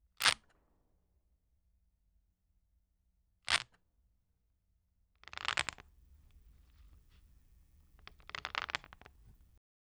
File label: Suction Paddle